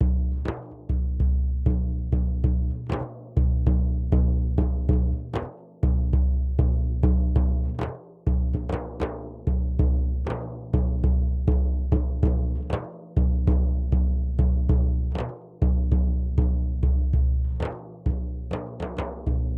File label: duff 098, Hip Hop India, 098bpm